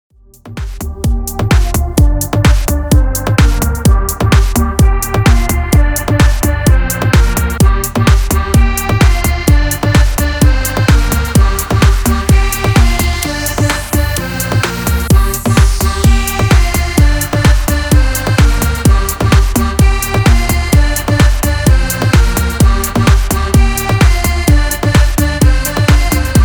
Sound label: Extended dutch beat 128 Bpm